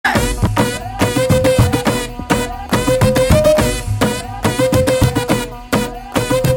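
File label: background music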